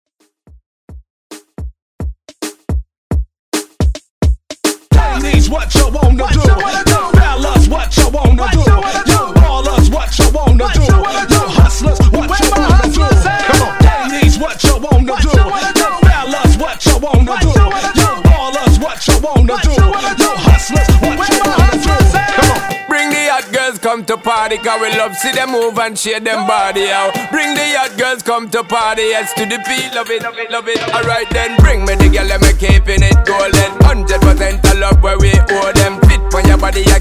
Dancehall

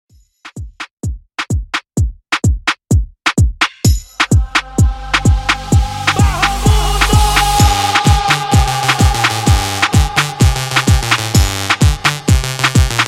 DanceHall Mixshow 128Bpm

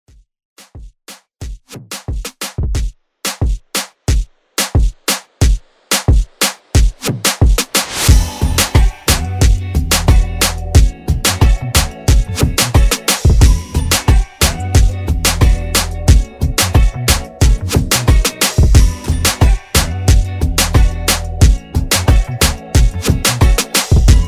Moombah Redrum

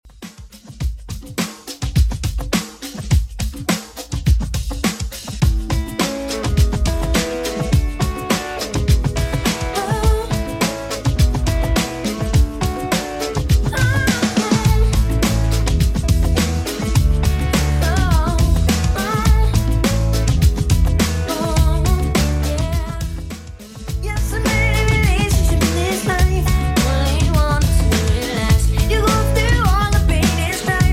90s Redrum 104bpm

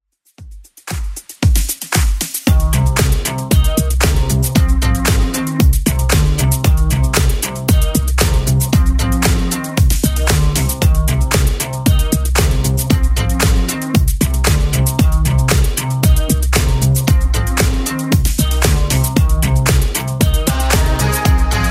80s Club 115 bpm

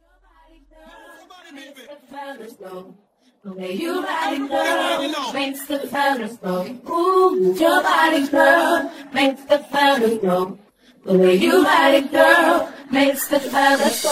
House Acapella Intro

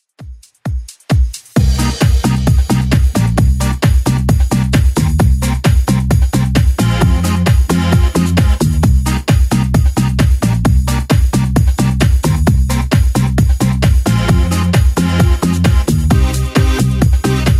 90s Redrum 132bpm

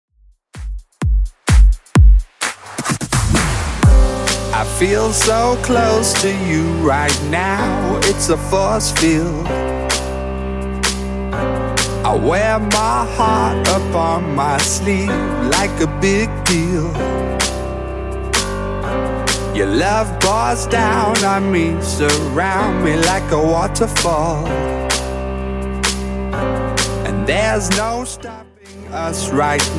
Top40 DJ Friendly 128bpm; Redrum

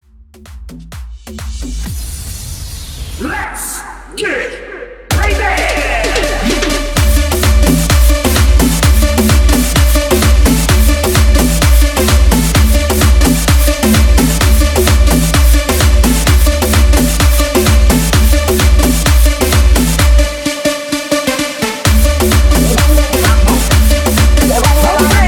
Guaracha Electro 129 BPM

